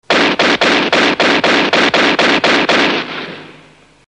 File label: target Shooting